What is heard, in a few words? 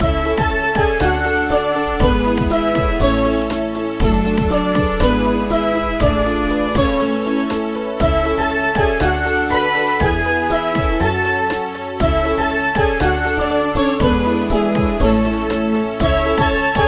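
background music lobby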